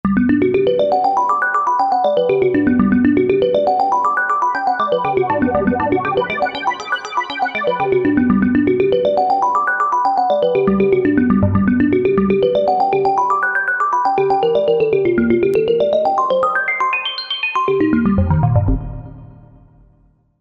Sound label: Digi Mallot